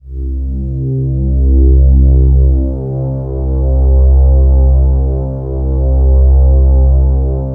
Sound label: DEEP PAD C2; DEEP PADS; Explosive Jungle; USB Soundscan vol.05; AKAI